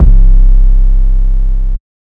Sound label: 808 Dist Rack